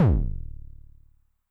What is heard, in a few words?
Bass Drum Pitch Depth Overdrive, 909; classic machines; 909mod